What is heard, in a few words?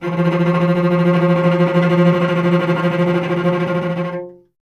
STR VC F 2, Cello; Miroslav Vitous; Solo Instruments VOL-1